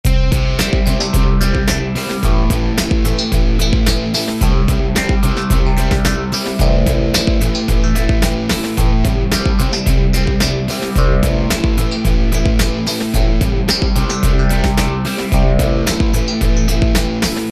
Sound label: Beat